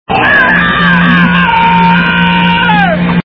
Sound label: scream; courage